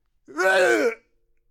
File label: male death